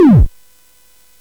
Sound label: sid CSG8580R5; Empire